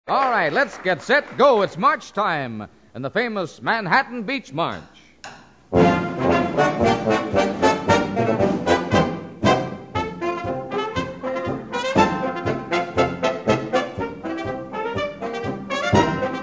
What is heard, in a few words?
march